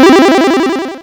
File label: synth, amiga med